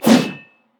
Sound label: darius basic attack